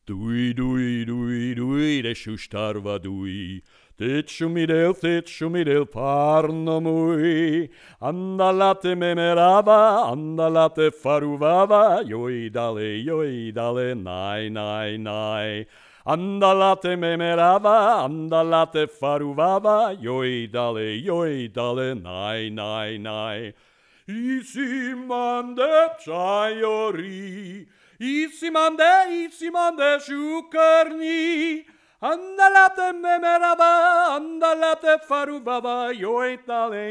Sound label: first voice, verse